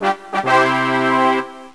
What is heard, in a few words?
gain skill